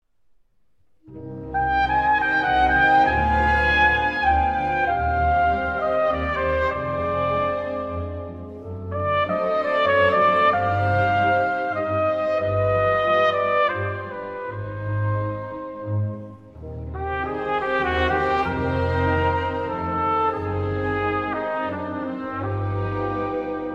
Slavonic Dances No. 2, Dumka, For Trumpet and Orchestra; Dvorák; Op. 72, B. 83; Arr. Lehn